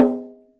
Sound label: bongo